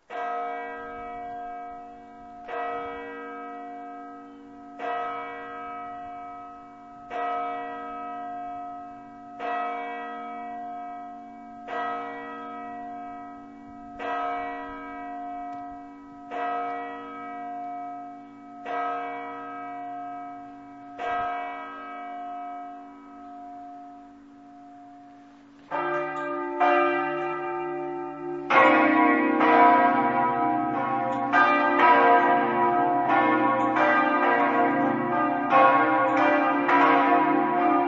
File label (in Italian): buia, campane